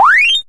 jump, Retro Sounds; Kenney's Sound Pack; Retro Sounds 1

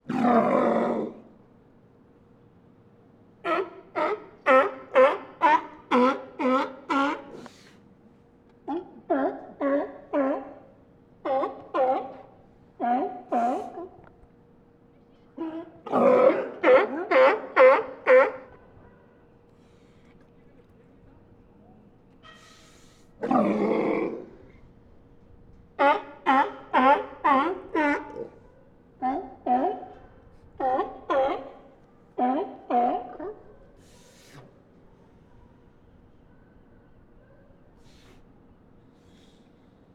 Sea Lion Bark Group; Bits, Scraps and Pieces Super Pack

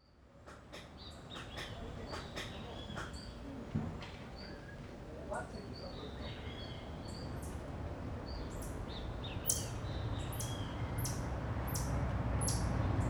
AMB External Rio Subburb Birds Dogs Monkeys; Rio de Janeiro; Monte Sound; Atmospheres of Rio de Janeiro

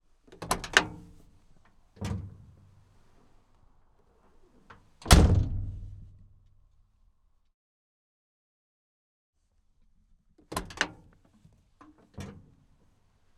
Scania 143, Door Open and Close from Inside; R143HL 1990; MS Decoded

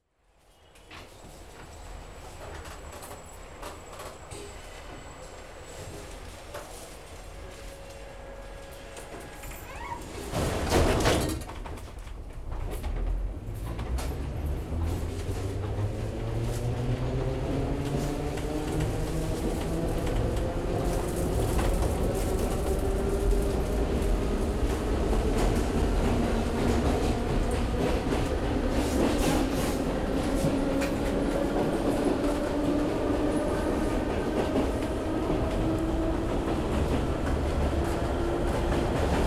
Germany, Railway Journey, S-Bahn; European Soundscapes; BBC Sound Effects Library

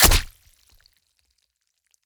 Melee Deep Wound, Gore; Sound Spark LLC

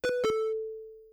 zap two tone; Kenney's Sound Pack; Digital Audio

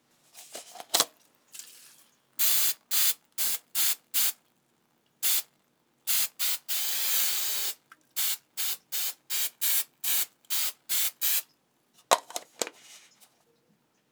hairspray, Bathroom Sounds; Kevin Durr; Essential Bathroom Sounds

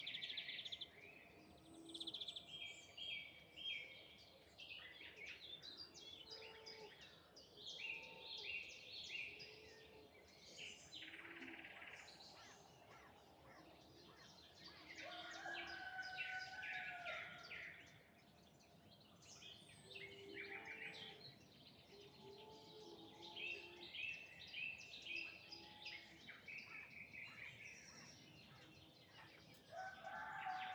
Countryside Evening Birds Cow Rooster Tractor Far; Fox Audio Post-Production; Nature & Field

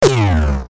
Futuristic Gun Sound; FXHome; Bullet and Gun

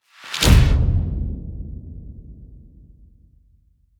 HITS; 99Sounds; Cinematic Sound Effects; Generdyn

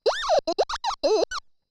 Robot Voice High Register Cute Squeaky

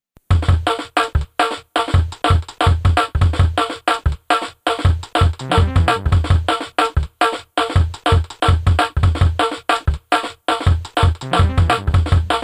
Drum n Bass H Stutter

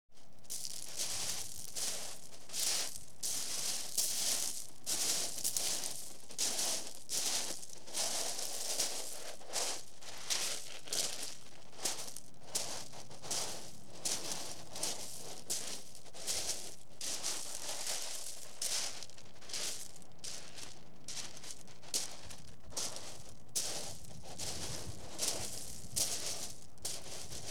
Footsteps, In Shingle; Audiences Children Crowds Foots; BBC Sound Effects Library

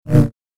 Double Bladed Saber Sound; FXHome

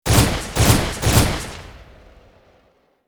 Bullet Time; FXHome; Bullet and Gun

